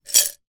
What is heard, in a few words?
broken glass pieces inside glass jar shake; zapsplat